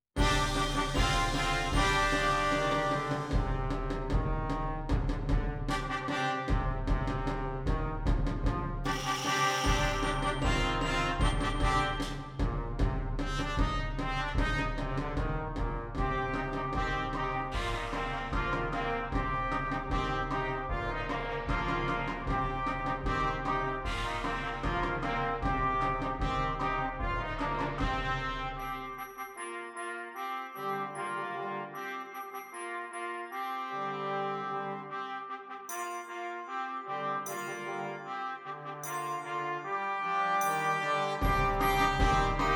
Brass Mockup

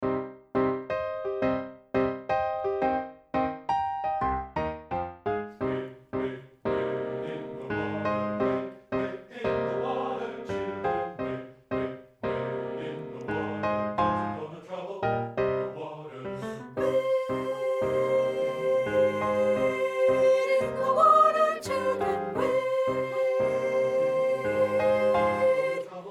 WADE IN THE WATER SOP

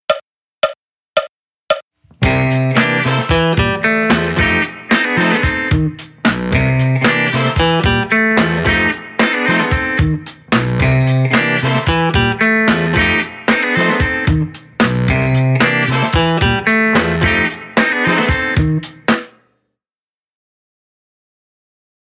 RnB Guitar

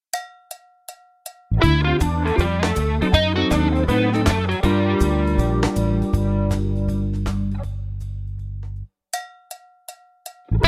jazz rock

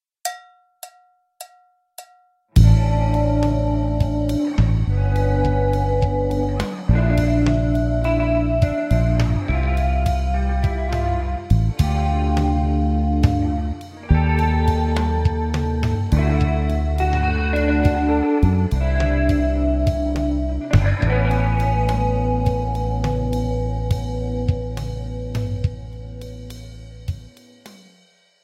jazz rock

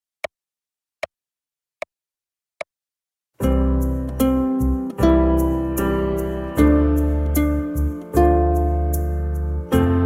uke kids

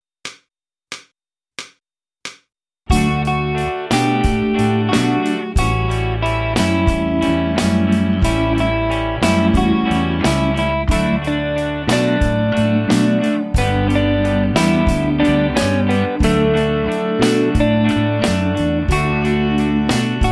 guitar method

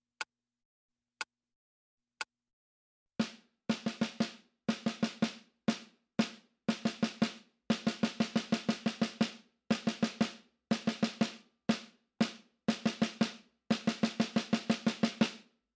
Bolero Rhythm

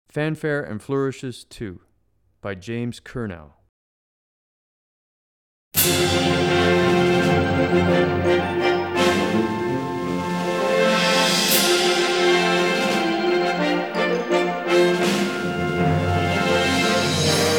Fanfare And Flourashes

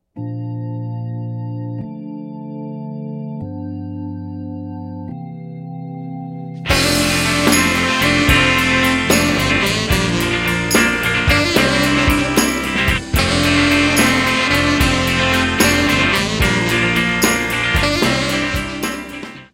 rock method

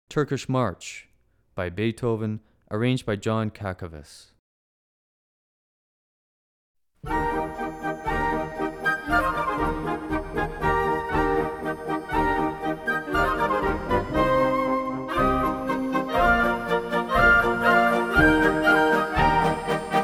Turkish March

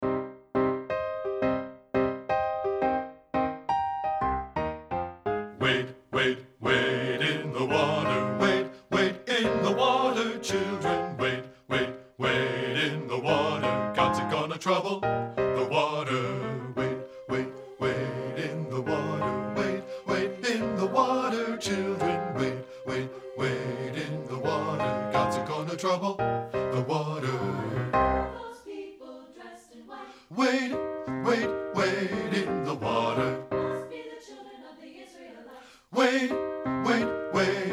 WADE IN THE WATER BASS

